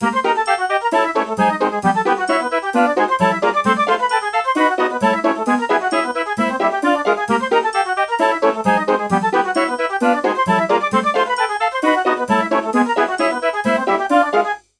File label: Woodwind